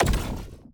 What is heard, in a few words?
explosion; Mindustry; Cleroi